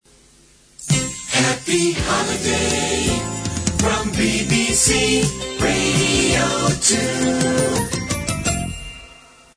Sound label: jingle